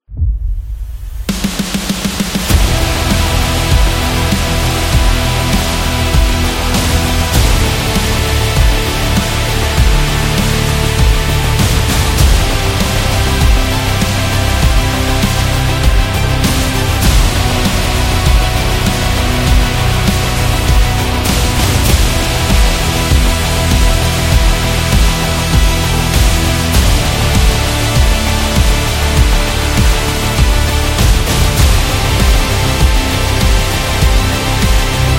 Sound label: Epic Promo Rock